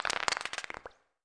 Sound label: rewind; Gonner